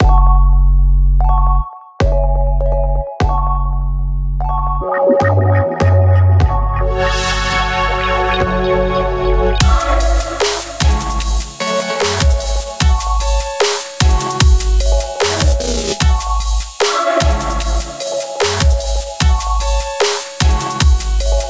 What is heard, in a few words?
HIP INST